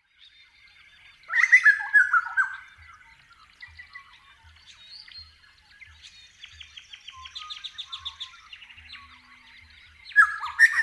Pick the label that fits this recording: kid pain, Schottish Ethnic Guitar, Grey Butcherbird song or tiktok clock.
Grey Butcherbird song